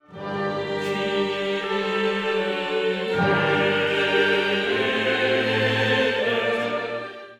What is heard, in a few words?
Kyrie Ascend